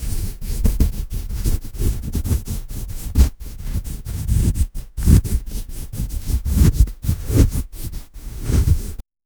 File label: realistic scratching soun, allergy